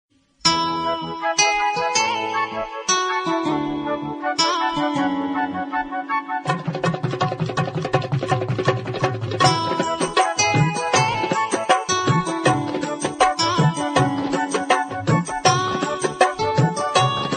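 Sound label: flute kannada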